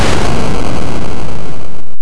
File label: explosion; gnome stones